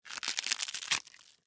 Tear Crunch Cabbage, Texture, Tear, Crunch, Cabbage, Leaf, Vegetable; Ripping; BlastWave